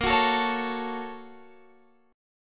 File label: Bb Minor Major 7th Sharp 5th, Guitar, Open F; BbmM7#5; strummed; x,17,18,16,16,16; JGuitar